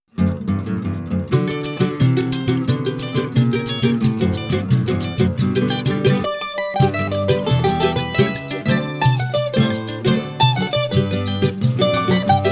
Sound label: songbook instrumental